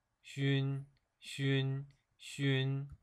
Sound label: xun sound